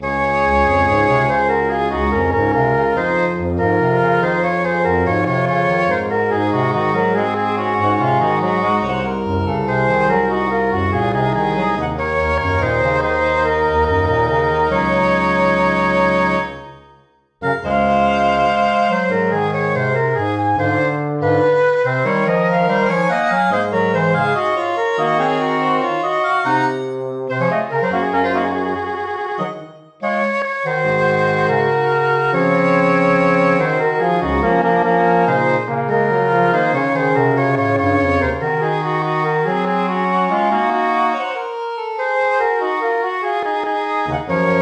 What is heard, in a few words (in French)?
Quatuor; Ces bigorneaux sont riquiqui